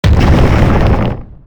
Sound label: collapse; LeagueOfGods